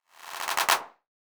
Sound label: CLAP FX